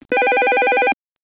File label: ring pattern